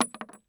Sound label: Inf Weapons; Red Orchestra 41-45